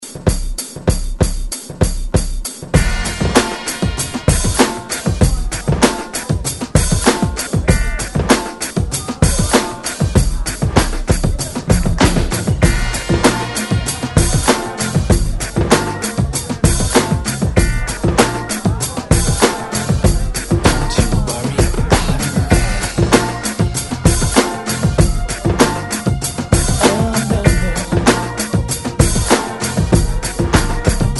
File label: ReDrum 97 Bpm